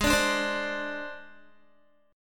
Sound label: Ab Major Flat 5th Ab Guitar; AbMb5 strummed; 15,17,14,17,14,x